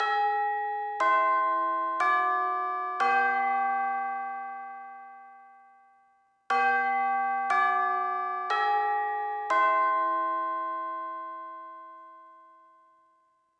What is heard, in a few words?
chime